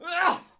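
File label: Cbmn Attack Vox; Civ4 warlords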